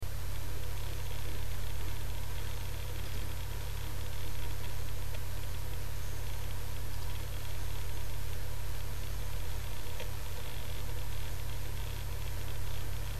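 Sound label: maxtor, hard disk; hd 80 maxtor seagate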